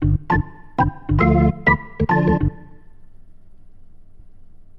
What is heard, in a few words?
intro jingle; NewGamePhysics; MontyHallGame